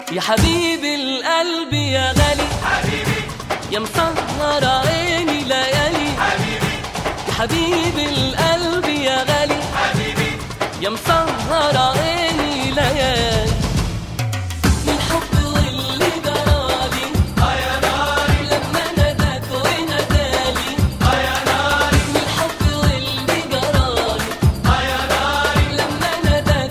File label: habibi arabic